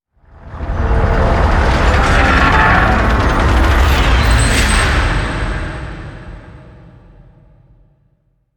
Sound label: Gear Stop